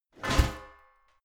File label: garbage can close lid hard